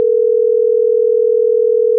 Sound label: Ringback 440Hz+480Hz; Microsoft Lumia-550